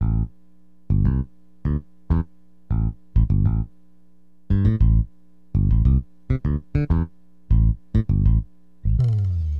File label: bass C; AcidJazz Funk; LiveAct Pool 1